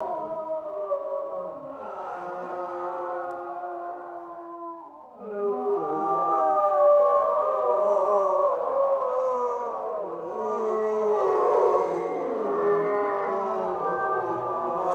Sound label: eerie group ghost vocal moans; crowds